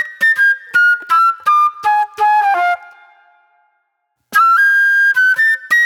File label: Classic Soul Flute